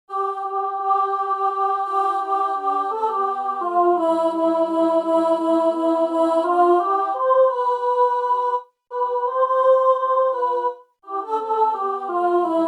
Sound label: Chorus